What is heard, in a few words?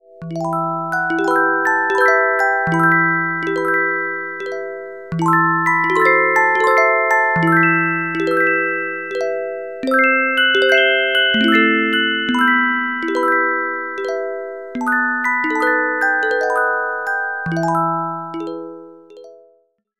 Carillon Music Box Melodies